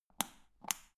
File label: power button turn on off